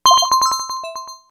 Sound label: Alert; Samsung; SGH-i300